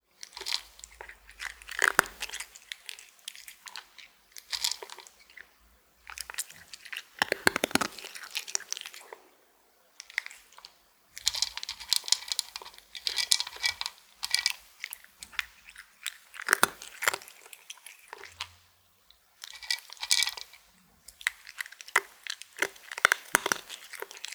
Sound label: Cat Eating Dry Food; SES Animals SFX